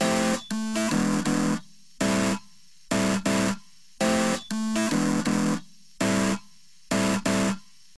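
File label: wet epiano, Multiband neural audio effect; Demo without amplifier; Wet-NoAmp; prism distortion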